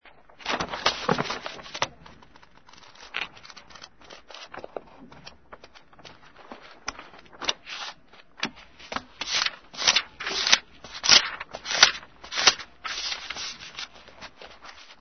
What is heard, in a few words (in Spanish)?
Pasa paginas pasa paginas